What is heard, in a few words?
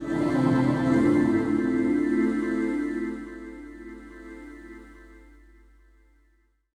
SPACE PAD 140 A; SpaceSounds